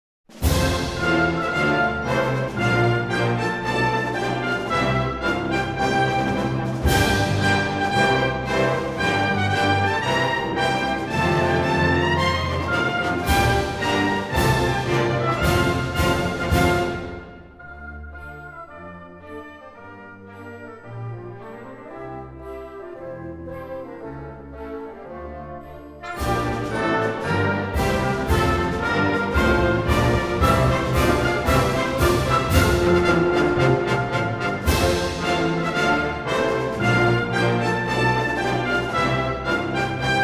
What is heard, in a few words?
arab, national anthem